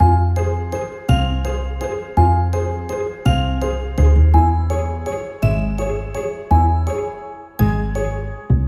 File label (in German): Klingelton Loop; WIR EMUs